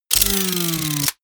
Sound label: wind down